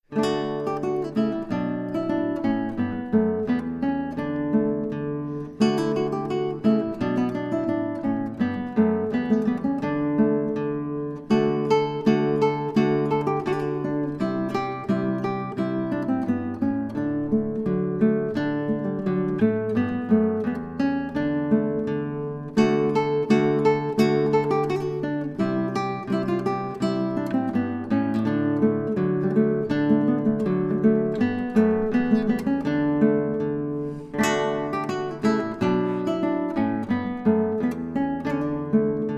Kemps Jig, classical guitar

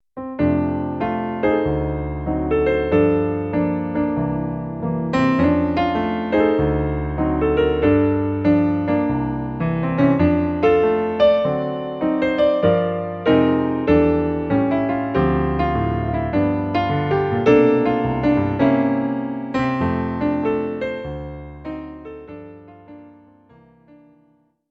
Piano

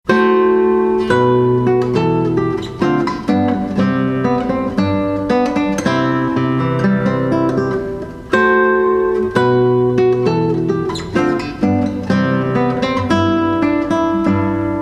Classical Guitar; The Wedding March (Mendelssohns March)

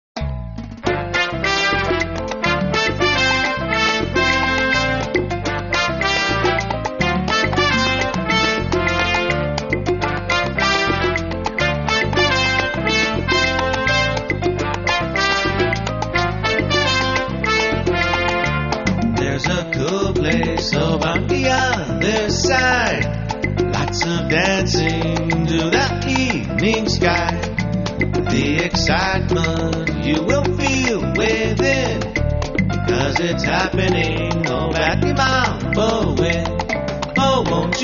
salsa style upbeat; live band

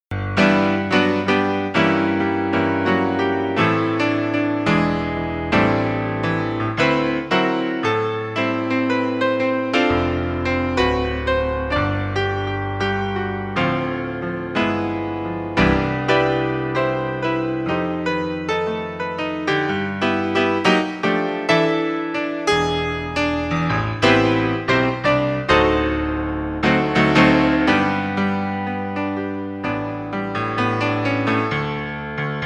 Solo Piano

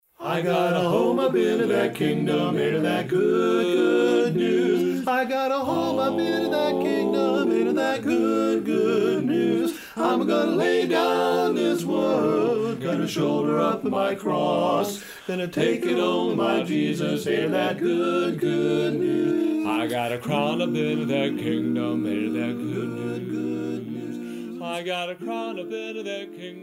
barbershop quartet

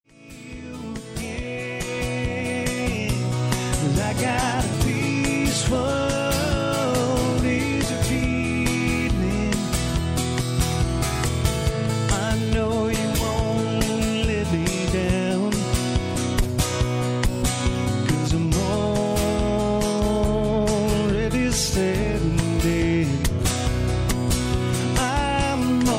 LIVE, Acoustic Guitar

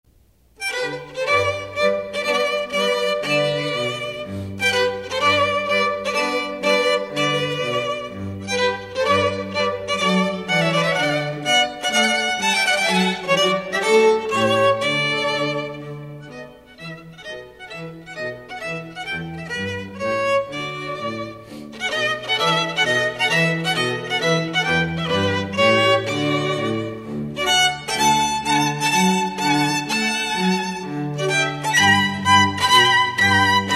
Trio Duo Strings; Live Band

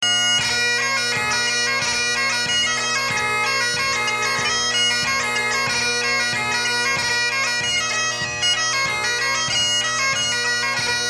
Reels, bagpipes

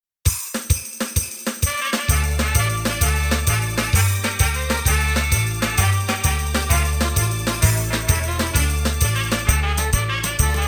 Ethnic medley, One Man Band

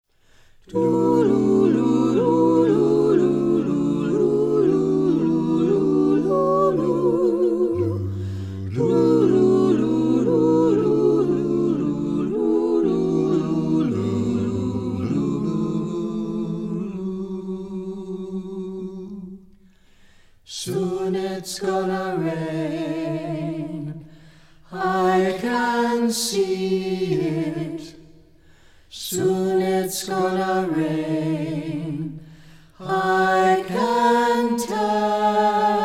Jazz Quartet

